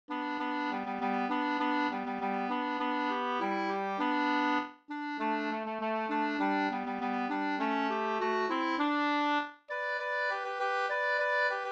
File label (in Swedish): folkdans Sonderborgskadrilj Gdur